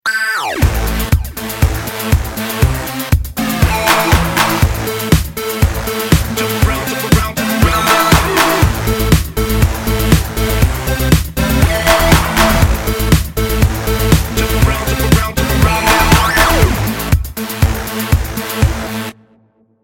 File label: Electronic Pop